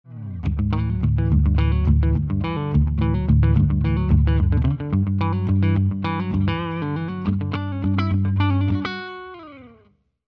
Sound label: EMG 60 neck clean